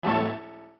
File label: combo; Puffer Popper